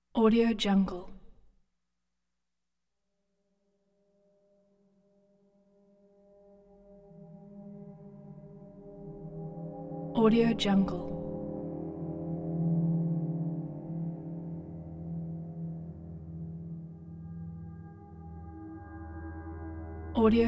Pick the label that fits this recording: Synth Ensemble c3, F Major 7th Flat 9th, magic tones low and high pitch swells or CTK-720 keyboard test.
magic tones low and high pitch swells